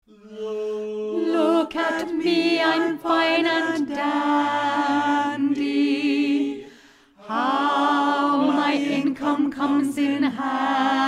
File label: Alt, choir